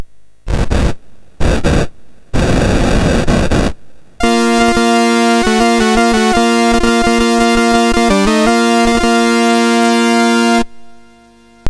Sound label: Fanfare, MOS6581; Nosfera II